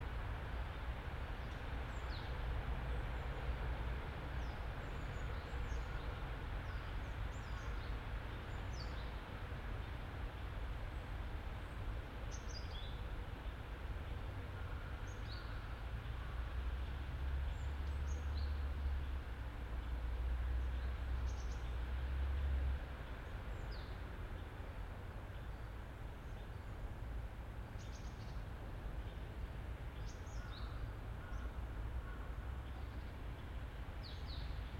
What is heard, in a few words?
yamanakako sound